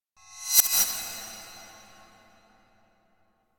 Whoosh Metallic Reversed Thin Attention Grabber - Very High; Hollywood Edge; sound designer's toolkit v2